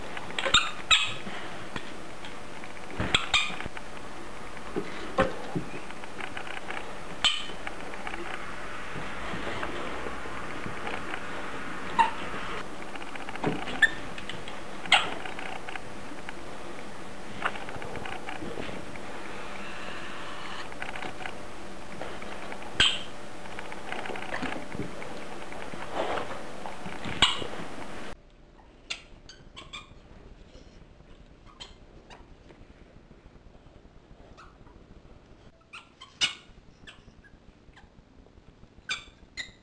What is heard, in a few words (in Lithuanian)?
cypimas cypsejimas su sunimi